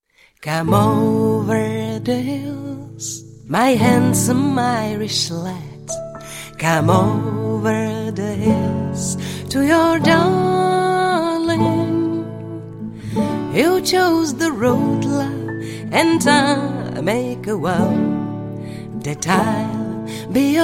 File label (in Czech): Hudba country folk folklór; Hudební vydavatelství AVIK